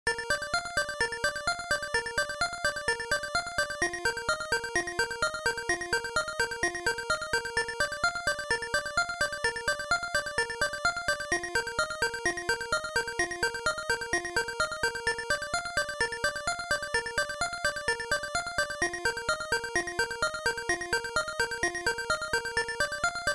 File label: Space Zone; SML2